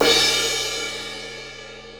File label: 18 inch jazz crash crash; hhx manhattan; cymbal; Sabian